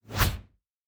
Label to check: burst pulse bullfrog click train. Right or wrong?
Wrong